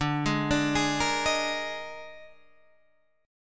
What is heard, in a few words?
D sharp Major 7th Suspended 4th D, chord